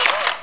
SERVO; TNPDEMO

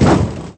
collide, vehicles

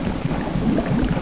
lava; dosgames; roc

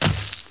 gravel land; hereticiidemo; player

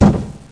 box; Listings Deluxe - Select Street Atlas 1.0

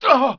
pain; PC Gamer IT CD 41; Daikatana

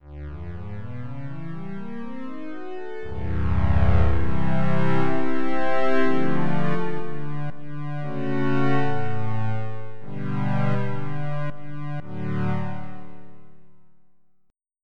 Pad; Onj's Soundfont

